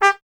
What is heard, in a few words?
TP G; Miroslav Vitous; Solo Instruments VOL-1